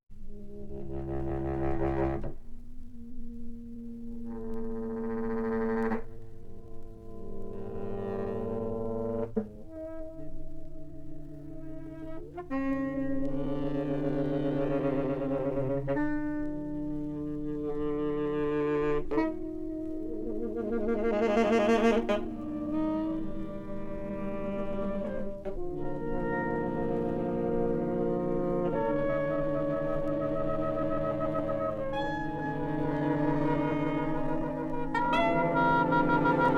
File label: Saxophone Orchestra